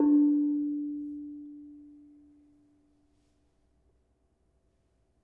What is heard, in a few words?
Bonang Barung soft, gamelan; gravityharps; timbrebox